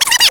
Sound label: Goalie Catches Ball noloop; FIFA Soccer '96